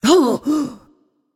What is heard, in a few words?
breath a; babayaga